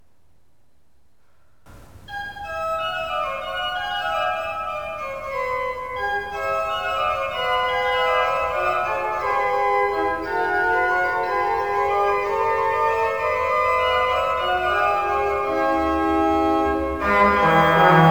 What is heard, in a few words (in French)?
clerambault trompette